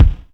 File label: Strange Kicks, Percussion; Dance Synths